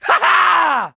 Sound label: Laugh; EDDY; Ed Edd n Eddy - Adromulus Pack; EEnE